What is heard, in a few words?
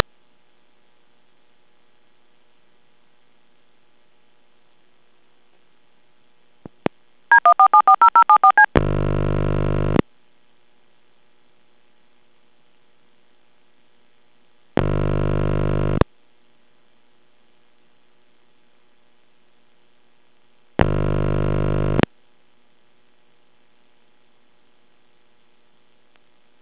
callerid before ring